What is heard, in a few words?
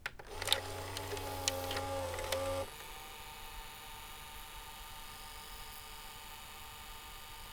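Broadcast VTR Tape Play; IRL Recorded; VHS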